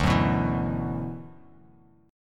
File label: D 6th Add 9th D; D6add9 strummed; 10,9,9,9; JGuitar